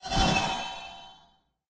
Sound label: projectile; Smite; Tiamat